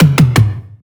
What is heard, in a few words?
tom bounce; Drum One Shots; Slate; SD MIDNIGHT Sample Pack